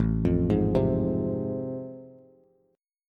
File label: A Minor Major 7th Sharp 5th A Bass, chord; AmM7#5 arpeggiated; 5,8,6,5